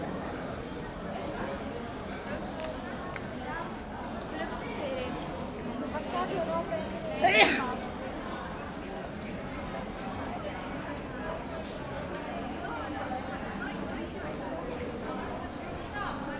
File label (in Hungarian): jobbra, tusszentes; olaszorszag; velence